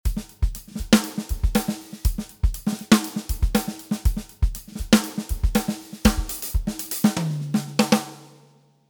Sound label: Drum Groove Groovy